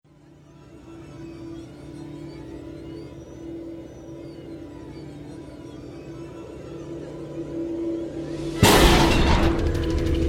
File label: Drama Action Production Music